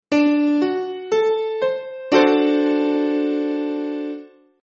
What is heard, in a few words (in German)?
D dur, konzertina; kunstklang